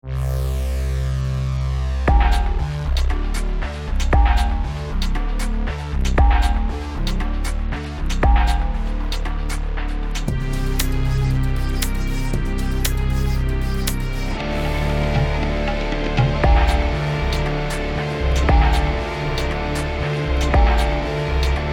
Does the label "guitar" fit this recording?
Yes